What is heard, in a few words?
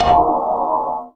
perc hit C2; Greytsounds; Scott Peers Sound Engineering